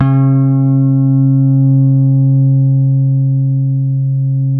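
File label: finger D 4, 8-string bass; Spectrasonics; Bass Legends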